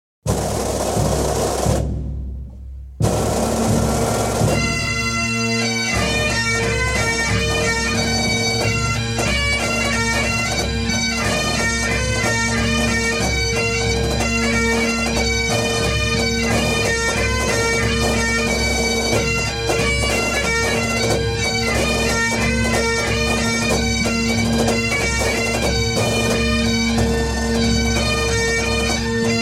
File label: Highland Bagpipes